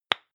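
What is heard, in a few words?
lipstick open hard, Bathroom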